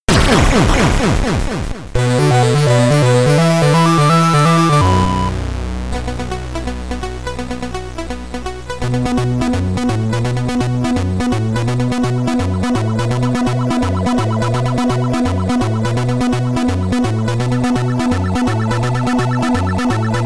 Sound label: Boss Theme Part 2 (SID Stereo); creatures 2; commodore 64